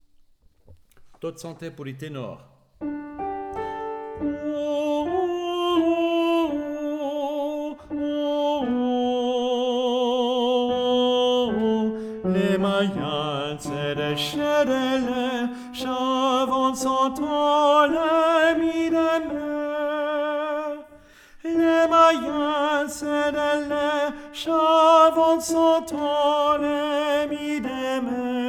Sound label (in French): Chants To Tsante T